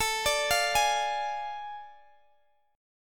A Major 7th Suspended 4th Sharp 5th, Guitar, Open D; chord